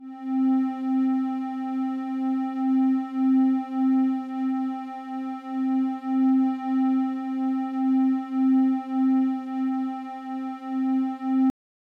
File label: Classic Syn